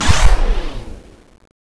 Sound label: wind boost down